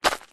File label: foley footstep single stony gravel road; zapsplat